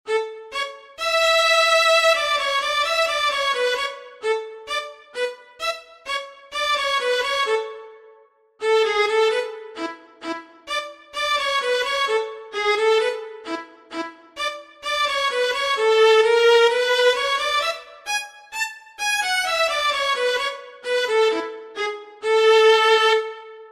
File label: gavotte